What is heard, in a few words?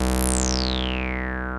303 FAT, BASSES; Pro-Rec - Synthsonic